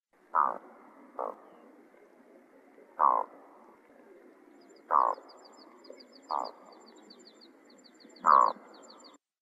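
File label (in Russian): gagara, loon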